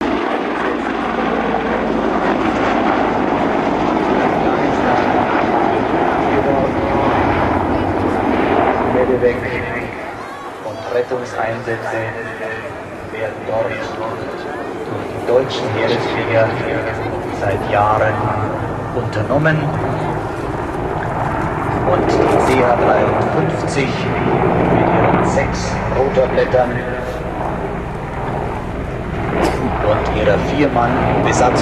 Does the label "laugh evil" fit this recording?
No